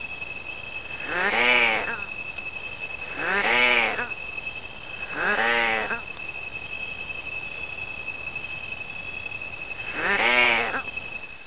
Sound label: striped possum call